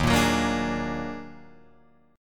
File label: D sharp Minor Major 11th, Guitar, Drop D